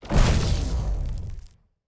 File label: Orbital Hammer AoE Get Hit; TANK; 3Souls